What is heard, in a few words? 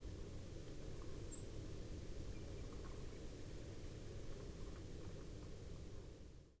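robin; rotkehlchen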